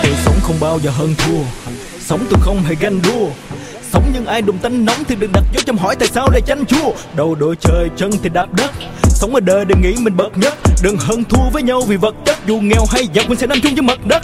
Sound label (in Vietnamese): Nhac Chuong Rap